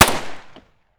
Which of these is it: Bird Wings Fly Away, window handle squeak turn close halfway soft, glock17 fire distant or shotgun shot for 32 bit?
glock17 fire distant